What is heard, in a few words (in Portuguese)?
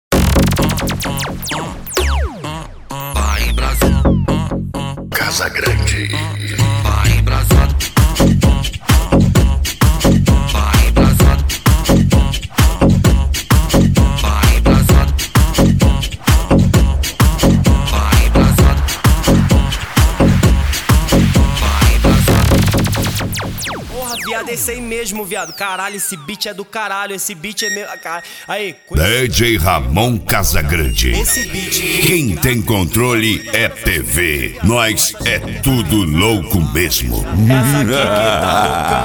mega funk